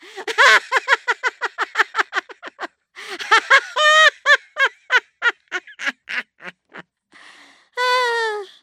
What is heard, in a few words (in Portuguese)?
risada da vovó; zoeira